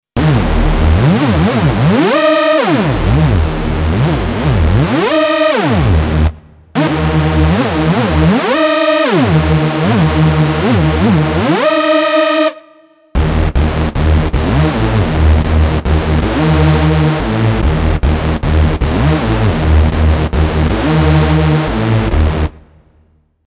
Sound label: Trancefloor, LEAD; ROLAND SH-201